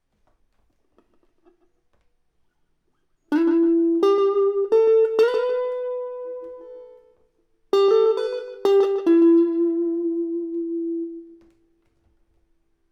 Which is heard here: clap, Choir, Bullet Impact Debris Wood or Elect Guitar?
Elect Guitar